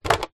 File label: leisure toy gun rifle plastic set down on carpet